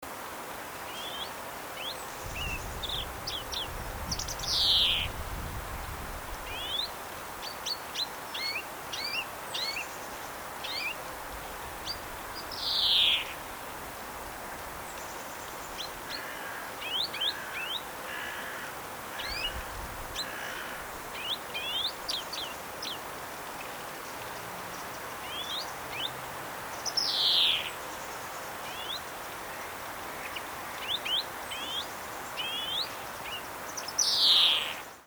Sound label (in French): verdier d europe